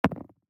DICE on Neoprene, Throw and Roll, Metal, 2 Two Dice; Samples; ArticulatedSounds; DICE Sfx Party (Extended)